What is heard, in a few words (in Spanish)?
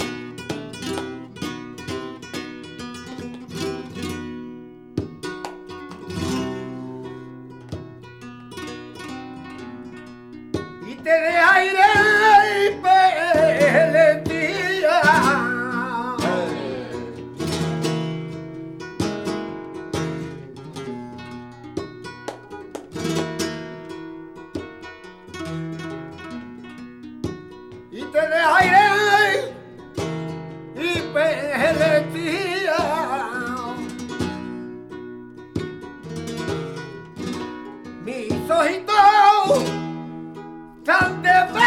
Solea, flamenco; de Carapiera